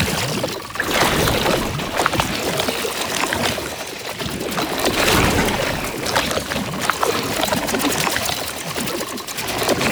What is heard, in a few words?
Proto Infested Move Loop; Enemies; Steps; Warframe; Brachiolyst